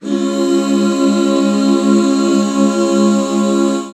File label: Db 6, back chords; Sound & Vision - Gigapack II CD 1